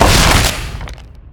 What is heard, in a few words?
loot disappeared; items